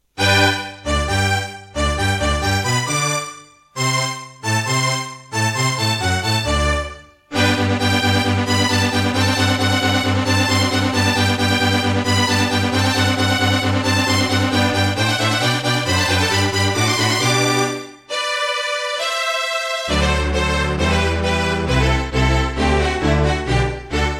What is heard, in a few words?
Serdaco Dreamblaster X2GS NATIVE; Mozart - Eine Kleine Nachtmusik partial; classical